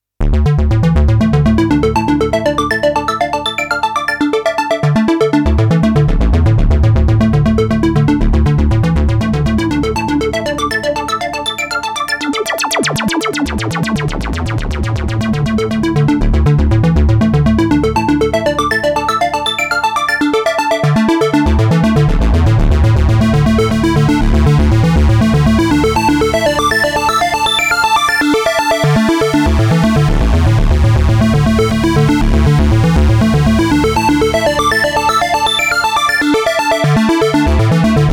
Clean Square

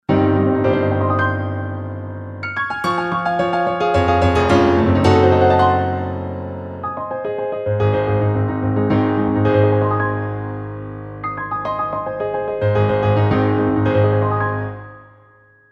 m3 piano klasik

